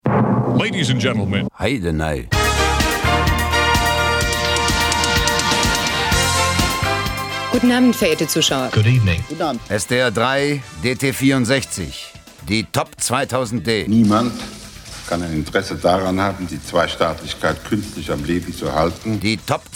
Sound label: jingle; sdr3; dt64; die top2000d